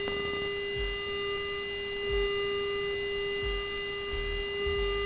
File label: 400hz B777-200 Sound